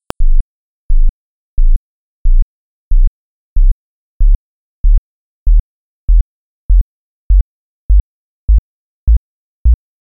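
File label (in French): 24hz 21096hz 5 ondes 32 notes musique impulsion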